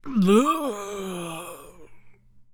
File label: Male Death, Zombies; zps; Male1